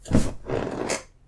sit on leather chair; レザーの椅子に座る１; 部屋の中のいろいろ

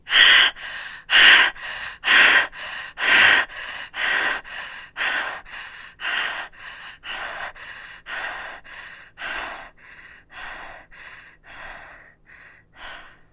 woman after run; horror

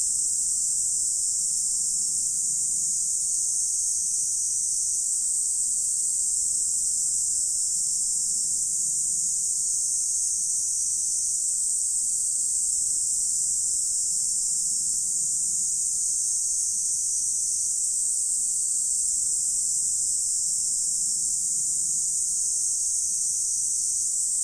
summer hill

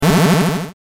powerdown

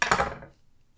putting kitchen knife; cooking

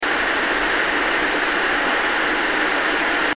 tv noise

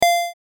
pickup

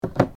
putting

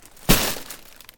throw away trash

